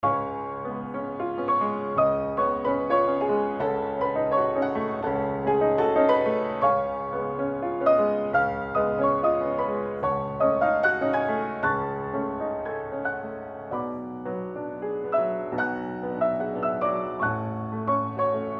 steinway; Strasbourg, chez Proust - KM140 ORTF

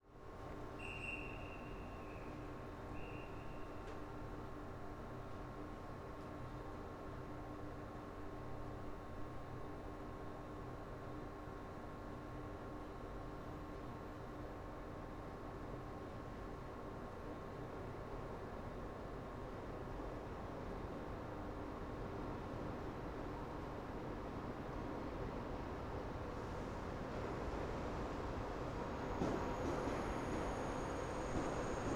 train

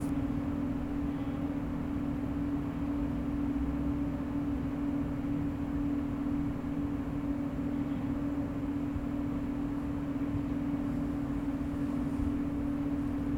archives room tone

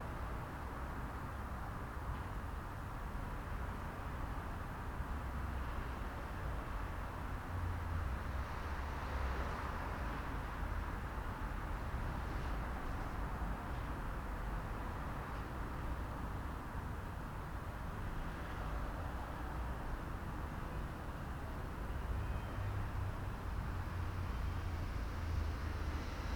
noisy

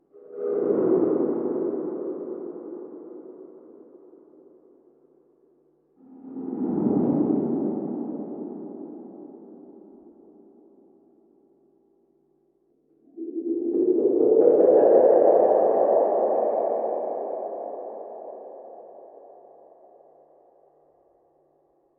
swell, Echorec / Strymon Flint / Neve 8801; séance de sculpture de sons; Ziegelau; Catalinbread Echorec / Strymon Flint / Neve 8801

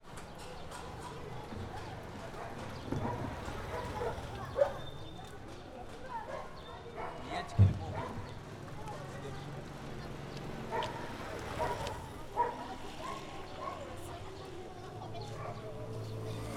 marrakech

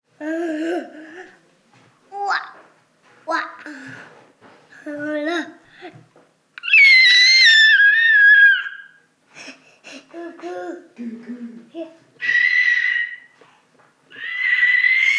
kawai

